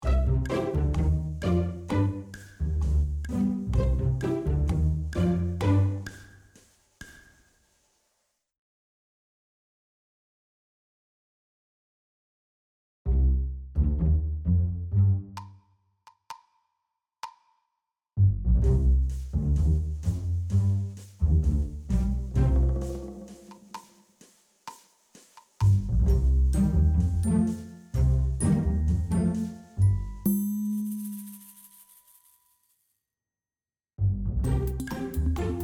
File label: Music Solo; Goed Eten Bij Een Ander Stel